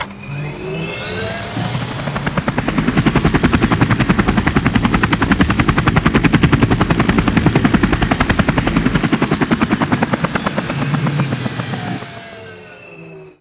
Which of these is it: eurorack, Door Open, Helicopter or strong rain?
Helicopter